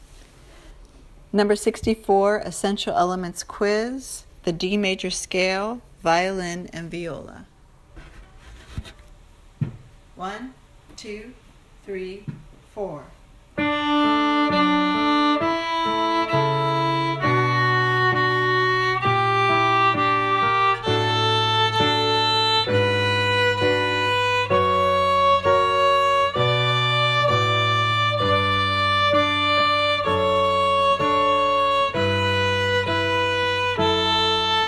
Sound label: violin viola